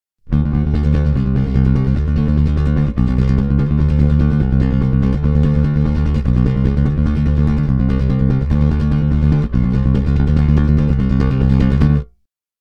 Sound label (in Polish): Rock kostka, pbhumbucker